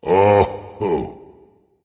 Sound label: ugh dragon; fafnir